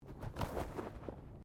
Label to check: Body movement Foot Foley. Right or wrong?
Right